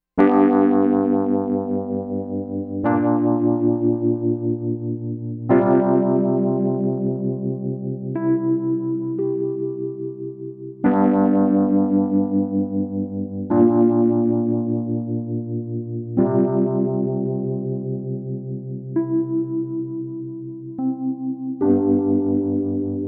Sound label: Wurli; Pro Tools Essential Demos; Digidesign